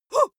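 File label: female attack auh, screams; schreie; menschen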